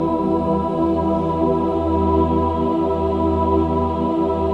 min chord, Symphony of Voices

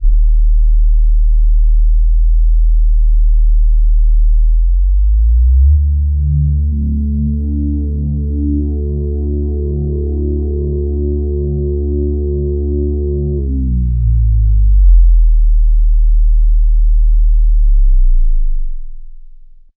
Long Bass, Drum n Bass; KJ Sawka; Drum n Bass & Beyond

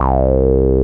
A BASS; CMP - Analogon

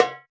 Tabla Rim; Roland BK-5; Percussion

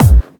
Clubby Kicks, Bassdrums

